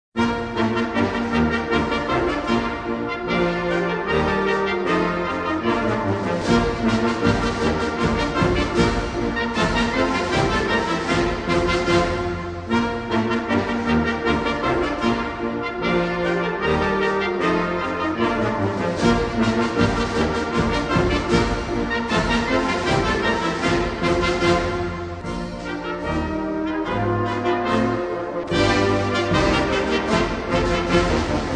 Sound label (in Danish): Marsch; Jydske Regiments